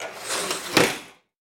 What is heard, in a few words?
Window Slide Close; Sound Effects Bible